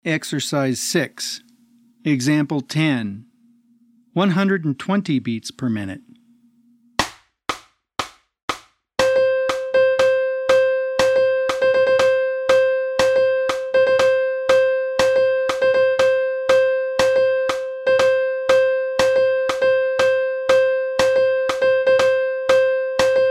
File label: 120 BPM